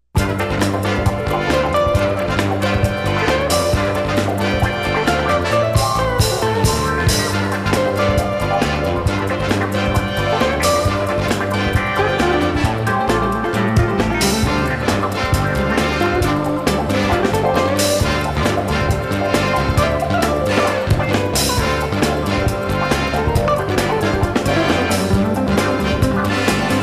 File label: Bonus Prize Rock Music; The Joker's Wild; Game Show Sounds